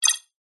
science fiction computer console beep button press